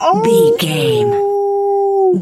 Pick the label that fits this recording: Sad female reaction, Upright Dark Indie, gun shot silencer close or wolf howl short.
wolf howl short